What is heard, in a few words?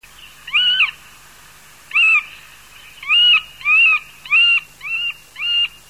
Dendrocopos medius